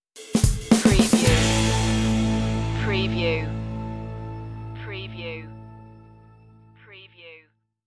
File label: casino funk stinger